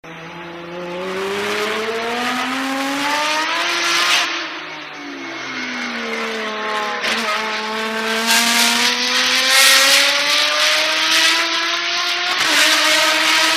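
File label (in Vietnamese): tiếng đua xe; va tieng dua xe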